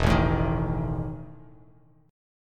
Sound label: E Major 7th Suspended 4th Bass Standard; EM7sus4 strummed